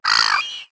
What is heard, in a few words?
seagull; World of Tanks Blitz; ambient milbase